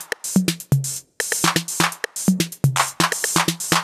drum body top 125, Classic Chicago House Drum Loops